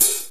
short open high hat sample e key, Royality free open hi hat sound tuned to the E note